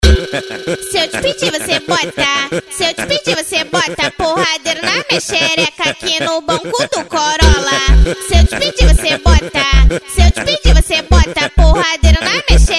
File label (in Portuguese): funk agressivo funk